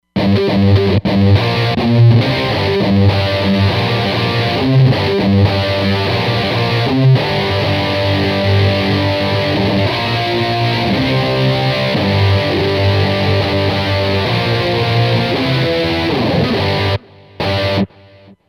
Chunky Crunch, Roland Fantom XR

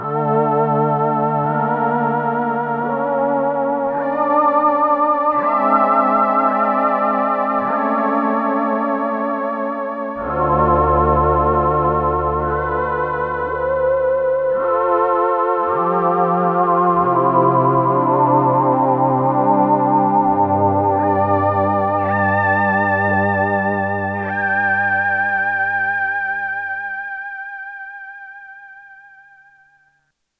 VOICE II, Korg DW-8000; Factory Patches

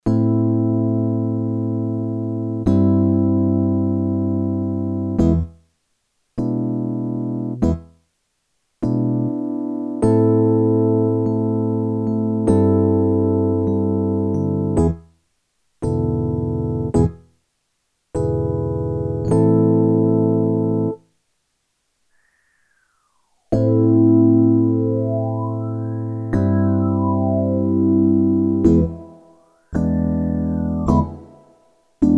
Vintage Phaser + Spring; t.c. electronics M300; Engine 1 + 2

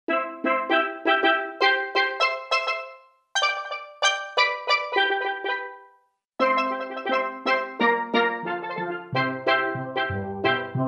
Steel Drum, Roland JV-880; Factory Patches

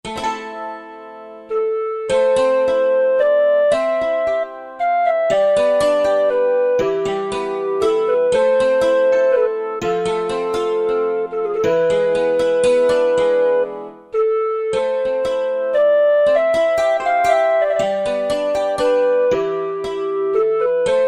Korg N364; Factory Combi demos

